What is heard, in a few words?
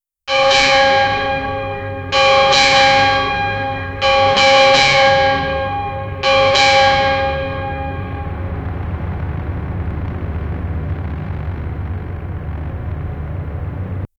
ghost pirate ship eerie; maritime